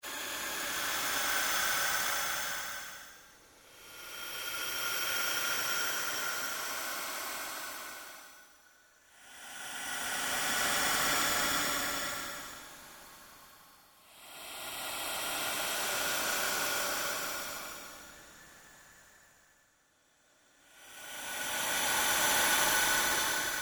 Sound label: Created Surge Drone, various sweeps, pulses and drones; Rises and Dives